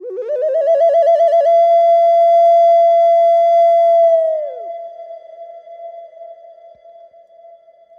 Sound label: Ocarina Trill G; Shaman Canyon